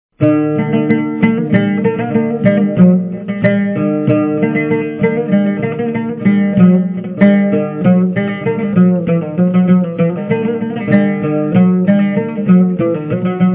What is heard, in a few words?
barbat